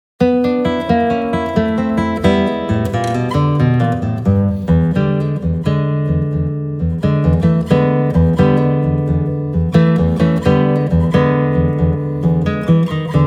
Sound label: Blues In E; Saitenwege nach Nordamerika Band 1